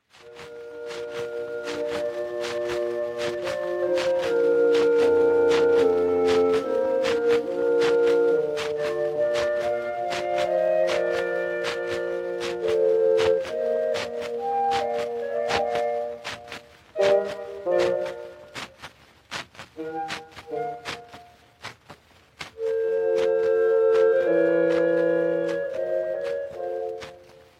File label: woodwind ensemble